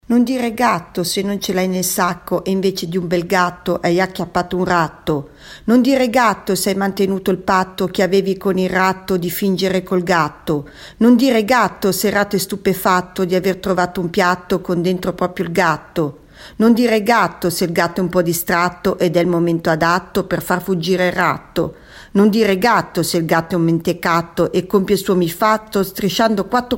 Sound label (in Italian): rap